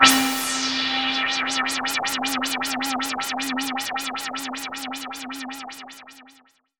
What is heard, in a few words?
SYNTH FX